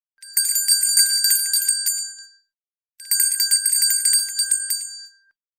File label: bell; smartyellow